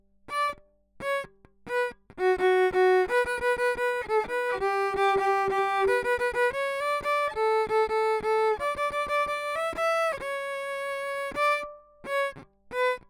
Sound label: Violin DI